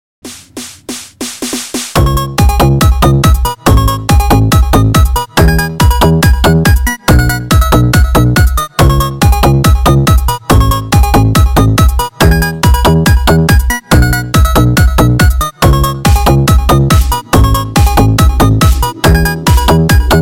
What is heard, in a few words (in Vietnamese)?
nhạc sàn cực mạnh